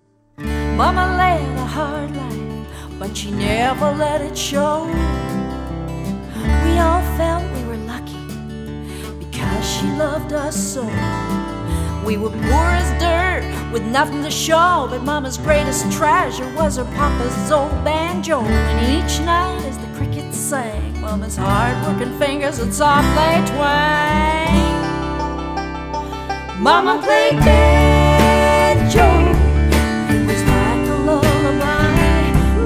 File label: Mama Played Banjo